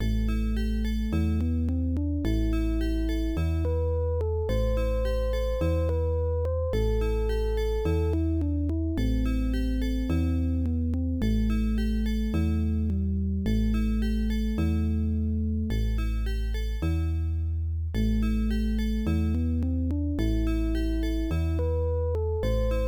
Dungeon; Secret of the Silver Blades; pc-9801

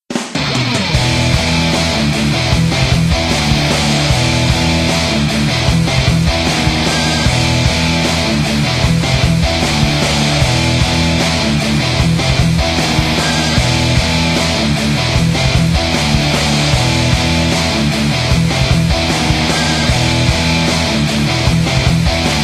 bgm neorock; maoudamashii; Drago Noka; ps4 switch windows gamerip 2022

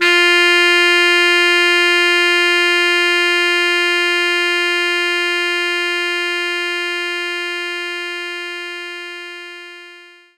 Tenor Sax F3; knights try

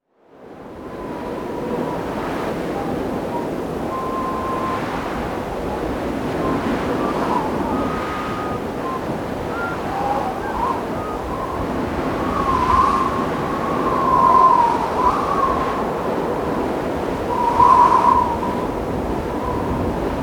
A Solitary Resounding Whistle; Kirby Star Allies

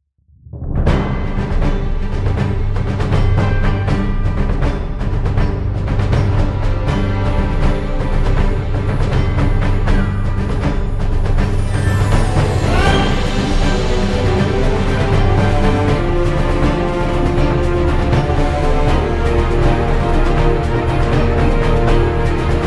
music savage music theme

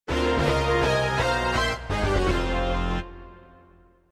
Lose; panic bomber fm towns marty gamerip 1994

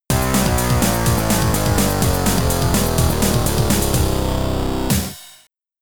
Game Over; Geeza Game Over; brian the lion; amiga

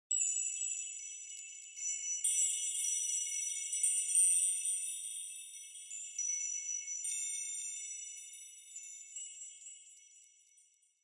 Kirakira; Yu-Gi-Oh! Master Duel

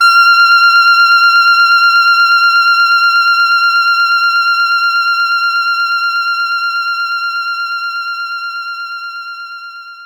Soprano Sax E3; knights try

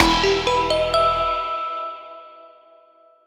Retry Jingle

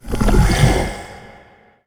boss respawn; umbra

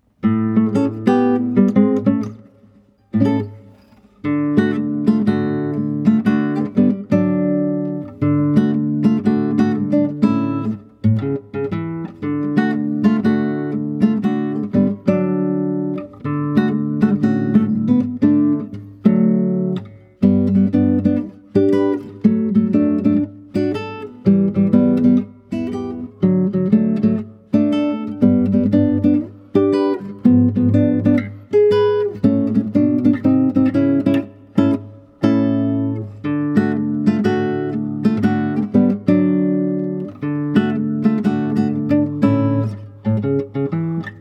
Ground Background Music, guitar; Super Mario Bros. 3; super mario series for guitar 2010

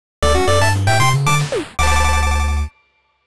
8bit Version; Super Mario Odyssey; YOU GOT A MOON! 2

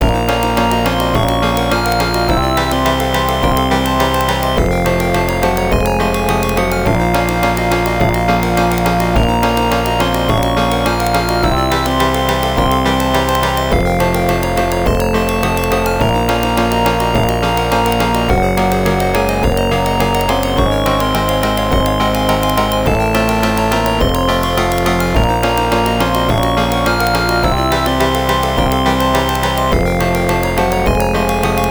Dungeon; Phantasy Star 3; Genesis

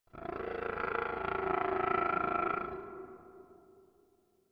Creature; we are the dwarves gamerip 2016

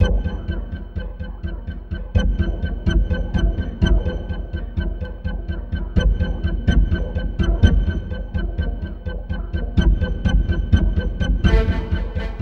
sounds music; Halo Combat Evolved; Xbox

